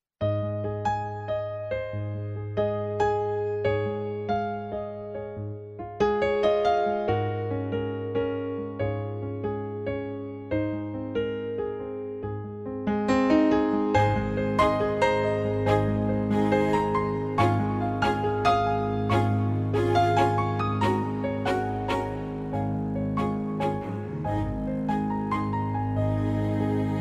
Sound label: Ambiance Romantic Piano